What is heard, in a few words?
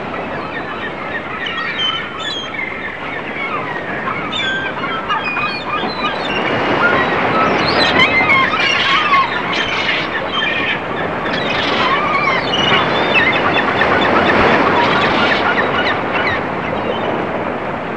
Caribbean Surf